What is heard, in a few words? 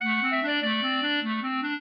clarinet; Mozart